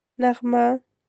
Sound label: melody